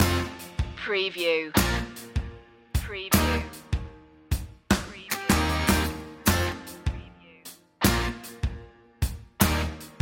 springing indie loop

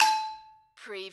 Hit Metal Pipe

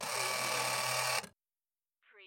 Pinball sound, motor or crane long

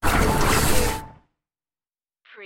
space door lock

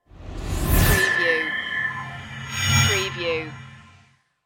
idents

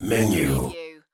menu

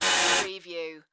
Door Buzzer Apartment

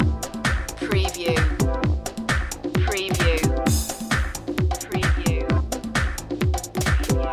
beach life loop

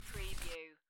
Playing Cards Gather Cards From Table

